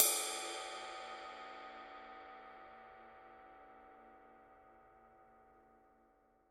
dark energy ride mark i body; signature dark energy 20

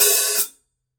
signature 14 sound edge hi-hat open; Forgées à partir d’un alliage secret de bronze; paiste

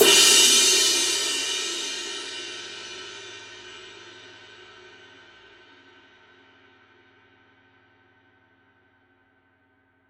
formula 602 classic sounds 24 medium ride edge

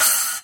dark energy splash mark i choke; signature dark energy 8

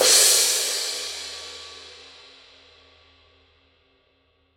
16 thin crash edge; Poids: dessus moyen fin / dessous lourd; Paiste

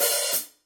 14 hi-hat open; signature precision 14

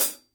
14 medium hi-hat closed; Forgées à partir d’un alliage secret de bronze; signature; paiste

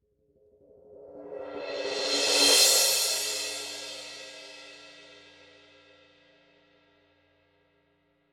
17 crash roll; signature precision

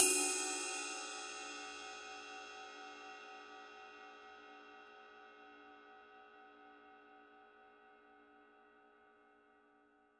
signature 22 reflector bell ride body; Forgées à partir d’un alliage secret de bronze; paiste

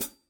14 medium hi-hat chick; Forgées à partir d’un alliage secret de bronze; signature; paiste

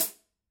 12 combo crisp hi-hat closed; Forgées à partir d’un alliage secret de bronze; signature; paiste

